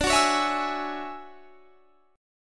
D Major Sharp 11th D, chord; DM#11 strummed

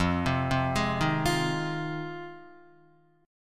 F Minor 7th F Guitar; arpeggiated; JGuitar

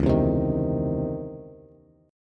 Db Minor Major 9th Db Bass; strummed; DbmM9; JGuitar

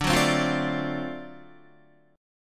D 7th Sharp 9th D down Guitar; strummed; JGuitar

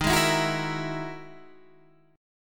E-Major Sharp 11th Eb

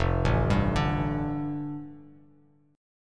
F 6th Add 9th, Bass Standard, F6add9 chord; F6add9 arpeggiated

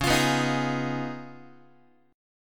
C sharp 9th C sharp down Guitar, chord; C#9; strummed; JGuitar

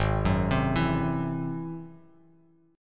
Ab Augmented 7th Ab down Bass Standard; Ab+7 arpeggiated; JGuitar

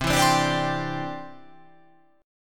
C-Major Add 9th

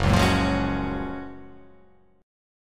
F sharp Major 9th

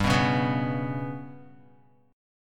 G Major Flat 5th, down, Guitar; GMb5 strummed; JGuitar

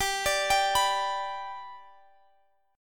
G Major G

